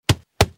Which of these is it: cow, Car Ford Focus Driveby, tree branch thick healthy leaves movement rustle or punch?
punch